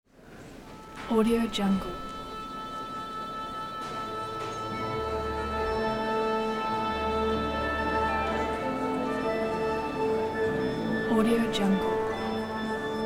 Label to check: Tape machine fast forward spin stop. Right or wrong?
Wrong